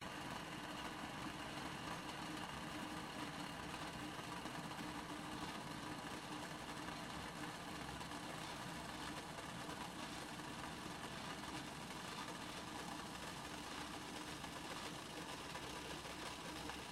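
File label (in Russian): закипает чайник свистит пар из носика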